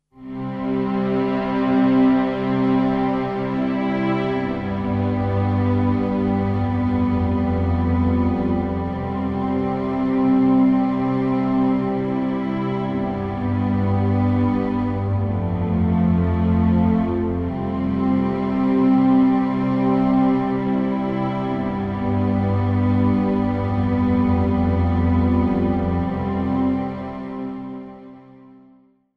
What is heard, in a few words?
Classical